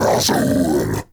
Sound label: Cast Vocal; Warframe; Uriel; Infernalis